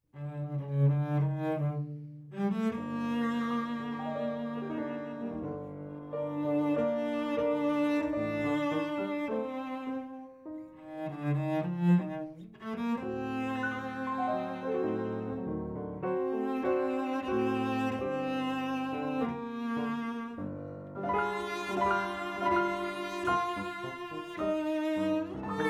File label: Tango cello piano